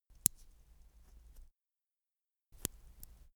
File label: metal watchstrap click